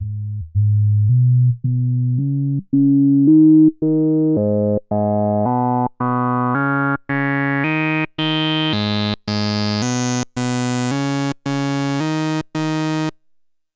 Genus 3 Poles; Rossum Electro Music Evolution

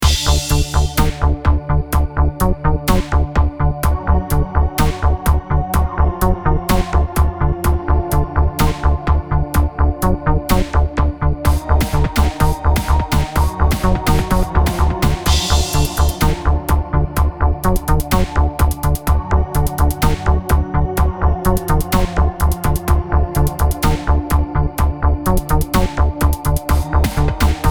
Stylophone Sampler Seq Mix

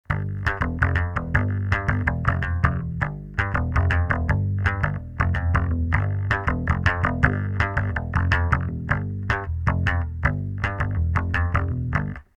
slap; ashdown acc

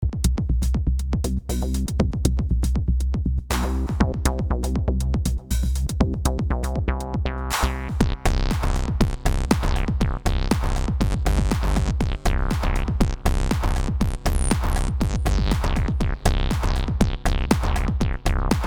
Nu Acid Funk Line Left Handed 909 Disto TB Bass

